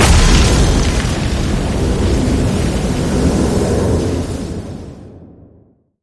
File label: boom; nasus skin05